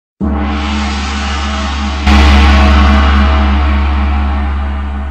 Big Gong Reverberant

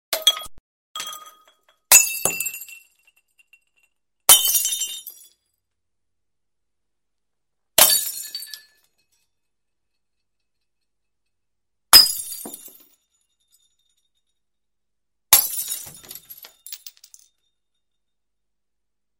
Glass Sounds

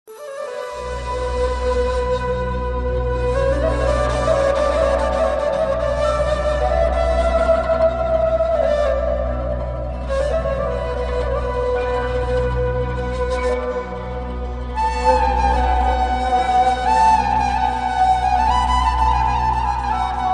Turkish Music

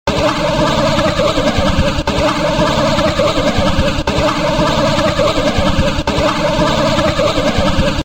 Flying Insects

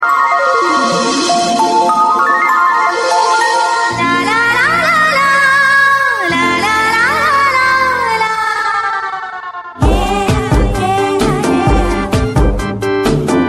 Nice Tune

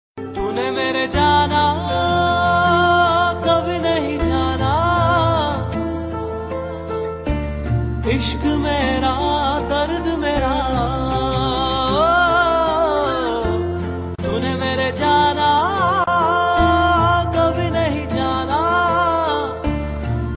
Sad Emptiness

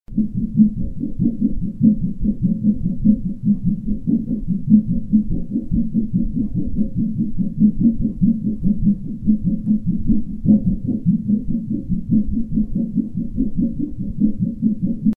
Ring Wave

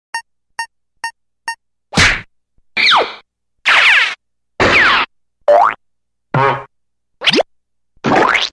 Mix Sound Cartoon

